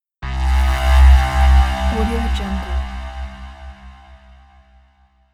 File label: alien spaceship